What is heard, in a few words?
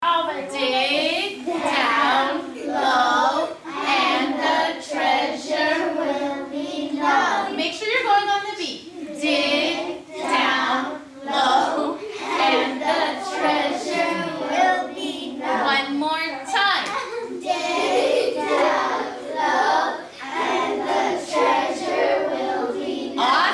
Dig Down Low Chant